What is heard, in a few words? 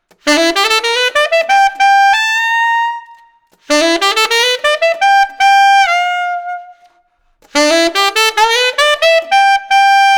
Florida Alt, tenor saxophone; les becs dautan florida slant tenor saxophone mouthpiece